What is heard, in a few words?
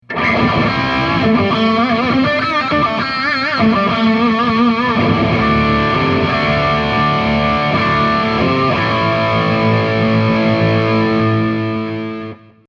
Distortion; v-wah